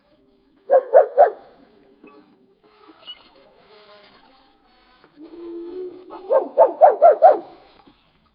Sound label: raccoons making sounds, urban